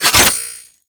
Glaive Recall; Tenno; Teshin

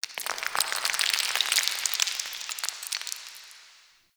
Zariman Stone Debris; Warframe; The New War; Ambience

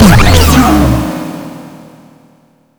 Buff Burst; Syndicates; Perrin Sequence

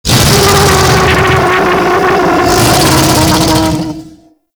Vessel Close Scream; Warframe; Tau; Sentient Vessel

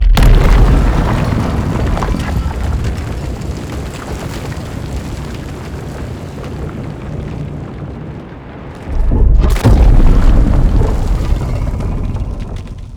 Egg Door Open; Tau; Doors

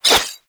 Rising Blades Whoosh; Warframe; Pax Duviricus

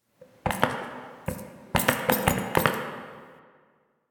Idle Little Jump; Ambience; Warframe; Entrati; Tagfer

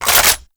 AK47 Reload Mag Out; Warframe; Tenno

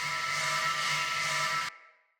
Sphere Polearm Throw Loop, Weapons; Player

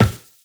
Run Glass, Footsteps; Warframe; Characters; Tenno

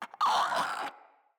Infested Crawler Hear Sound, enemies; Crawlers

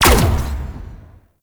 Capital Ship Turret Fire; Warframe; RailJack; Corpus; Corpus Capital Ships

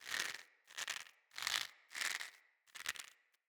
Hanging Sign Swing Loop; Dendrit

